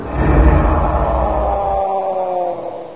die, zgrunt

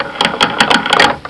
lockpick success

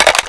hecate2 clip in, weapons

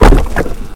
wall place stone

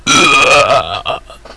dark die

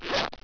spark

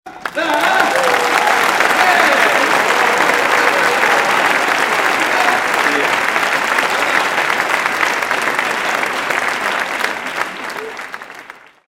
cheering

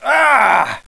male eye pain; jcdenton

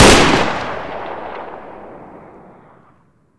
saw fire

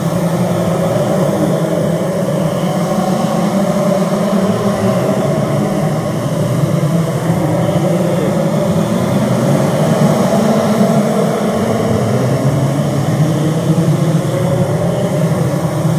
controller presence l

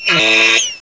pit drone die; betapitdrone

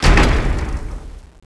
door stop

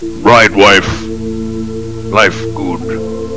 ride

